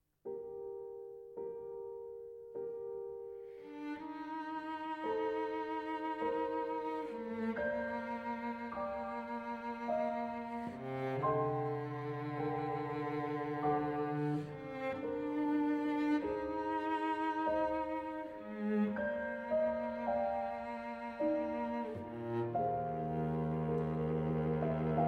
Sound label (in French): Sonate en Ré Mineur, Andante